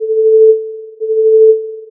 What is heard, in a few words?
amp Envelope, tone